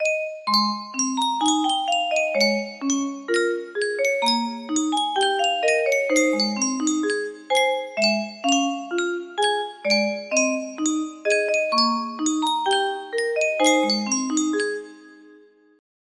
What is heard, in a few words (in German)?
yunsheng spieluhr